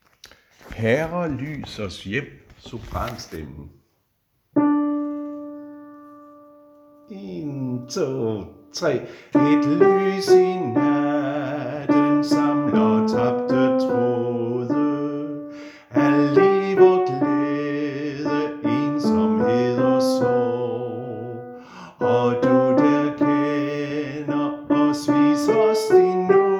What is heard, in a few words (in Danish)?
sopran; harlev koret; Herre lys os hjem